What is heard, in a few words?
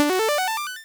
Sound SFX Scroll Collected; ninja ming